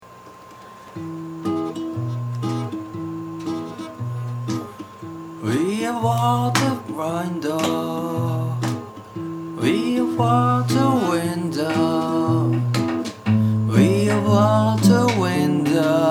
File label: guitar guitar; tonica